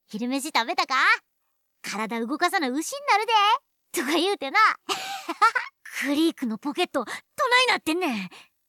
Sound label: Vocals